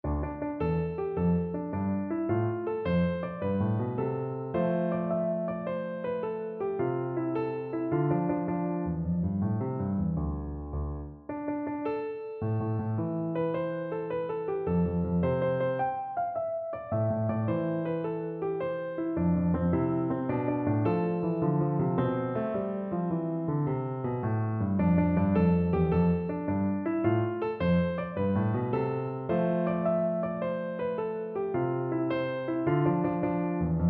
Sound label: scherzo piano; moorside suite